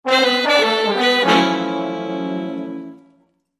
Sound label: Music Logo; Unison Big Band Jazz Brass Melody.